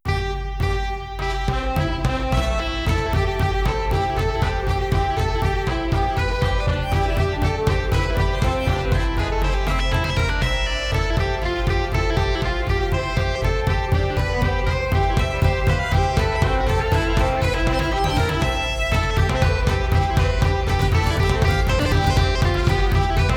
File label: Highlands Folk